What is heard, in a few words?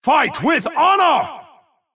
MobVoice Black Knight Attack